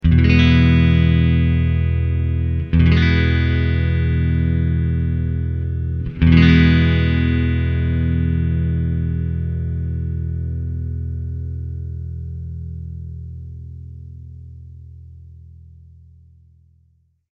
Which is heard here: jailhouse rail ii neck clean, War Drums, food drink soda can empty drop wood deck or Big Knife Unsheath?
jailhouse rail ii neck clean